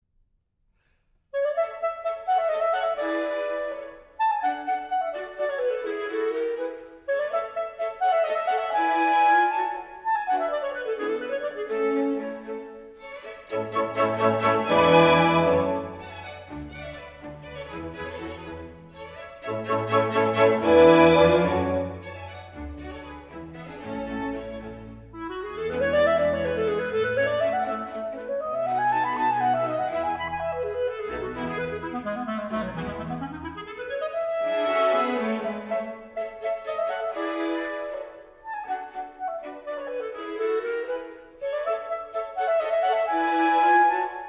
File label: Mozart Clarinet Concerto Rondo